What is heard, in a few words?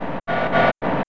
Reverse Riff OS; Sonic Foundry; Rondo Brothers Trip-Hop Loops & Samples; Apologists